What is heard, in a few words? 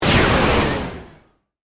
Laser Burst Descend; Science, Fiction; Lasers; BlastWave